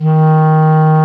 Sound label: WND CLARIN, Clarinets; Roland LCDP04 Orchestral Winds; 90 s Sample CDs